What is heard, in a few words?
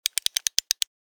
Tactical light button click